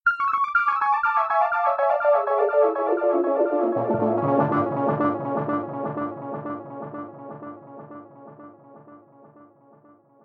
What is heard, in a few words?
arpeggios soft arpeggio